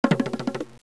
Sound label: Drum